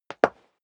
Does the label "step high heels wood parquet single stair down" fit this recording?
Yes